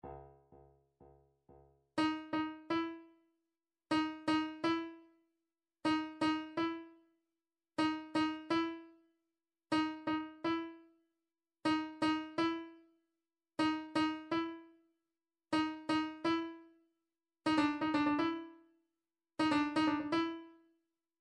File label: drum trax per